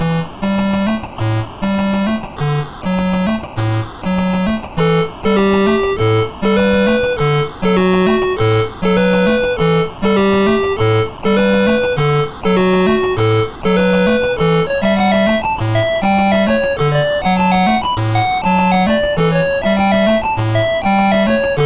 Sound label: MOS 6581